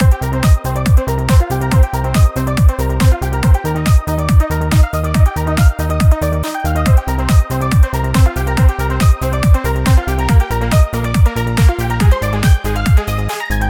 bgm

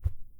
step; 足音(STEP SE)

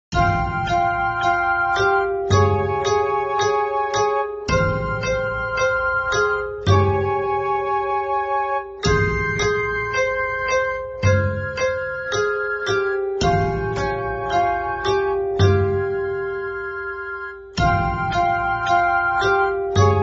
National Anthem; Xurian

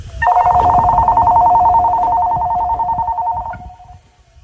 Bell

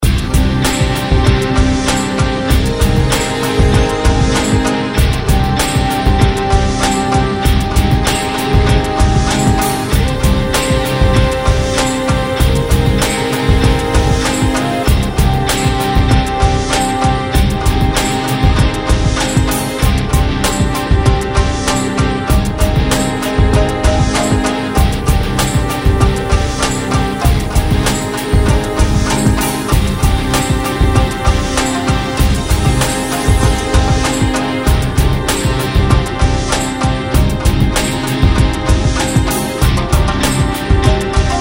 bgm default

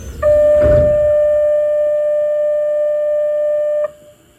Bell

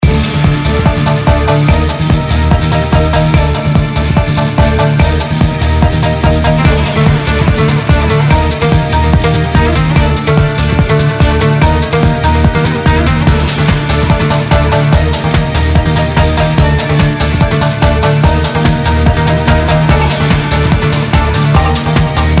bgm war disadvantage

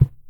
step; 足音(STEP SE)

